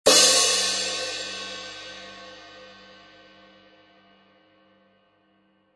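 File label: crash, Z40316; ZDJ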